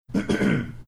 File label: clearing his throat